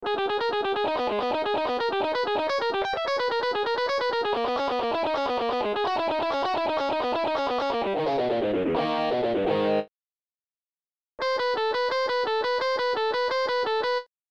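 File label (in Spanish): Ejercicio Clásico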